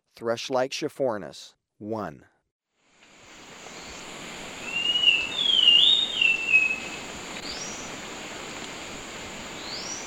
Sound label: Thrush-Like Schiffornis, Birds of Ecuador; S. America